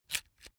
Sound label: electric drill template slide out slow; maschinen; bohrer; soundpool